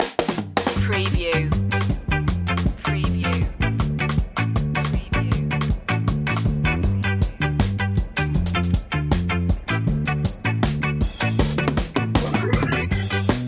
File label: ska jump underscore